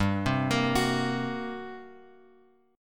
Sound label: G Minor Major 7th Double Flat 5th, G; GmM7bb5 Chord {3 3 X 3 X 2} arpeggiated